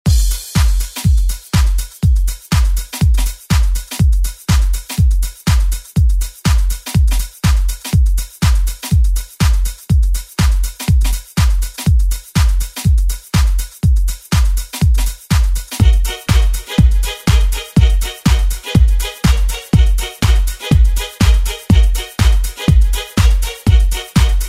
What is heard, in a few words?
90s ReDrum; DJ Mhark